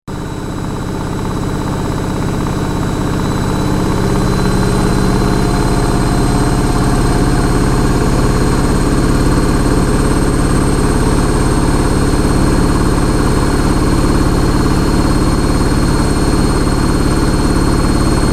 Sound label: Spin Cycle, Spin Cycle of a domestic washing machine; Laundry; Household